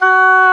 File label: Oboe D'amore; Roland L-CDX-03 Disk 1; Oboe 4-8